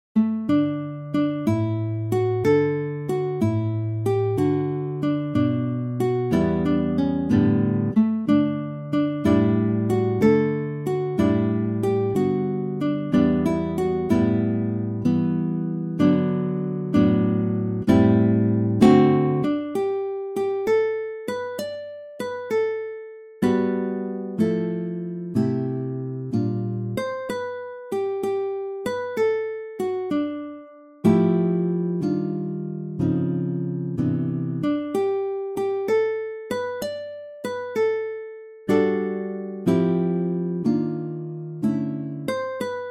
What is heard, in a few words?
guitar; i saw three ships